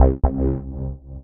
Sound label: DM BAS, Dance Mega Synth